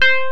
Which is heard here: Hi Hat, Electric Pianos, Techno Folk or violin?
Electric Pianos